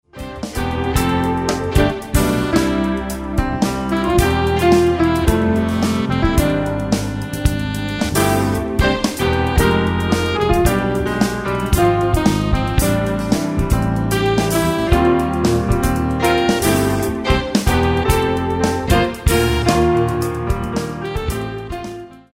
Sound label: Modern Jazz